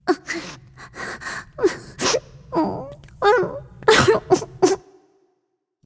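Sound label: nonverbal boohoo; smite; voicepack; izanami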